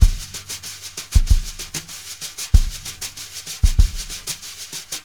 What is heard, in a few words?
BRUSH LOOPS